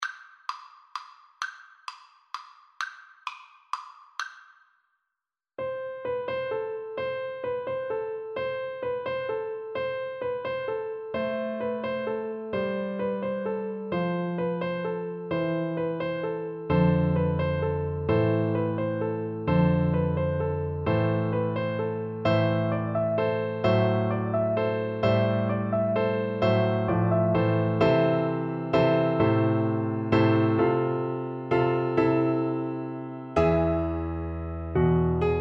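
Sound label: carol of the bells, xylophone; Percussion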